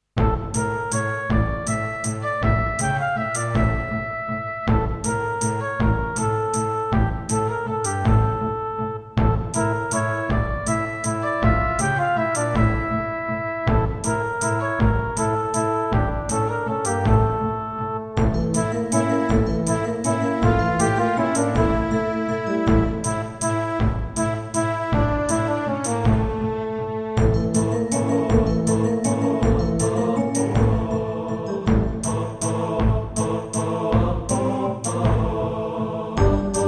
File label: Roland CM-300